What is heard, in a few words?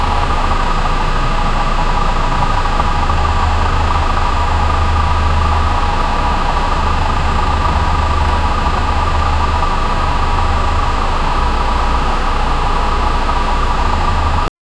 realistic sound of a prop, buses